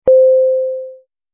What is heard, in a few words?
alarm beep gentle